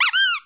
plushie; casualtiesunknown

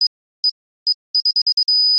turret count; casualtiesunknown

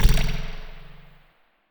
mushroom bounce; casualtiesunknown

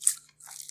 syringe; casualtiesunknown

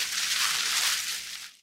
bush; casualtiesunknown

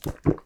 drink; casualtiesunknown

